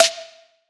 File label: Snare E; Drum One Shots; Snares; Cymatics; Light Free Sample Pack; Bonus Samples